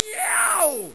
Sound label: YEOW; Leisure Suit Larry 6 - Shape Up or Slip Out; Floppy DOS; scummvm